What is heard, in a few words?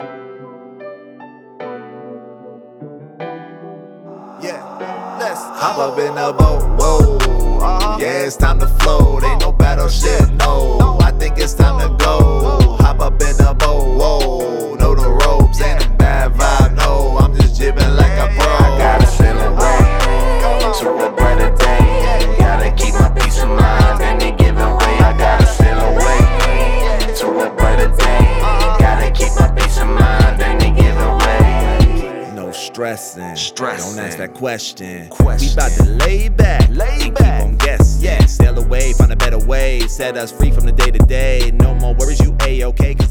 Rap Sample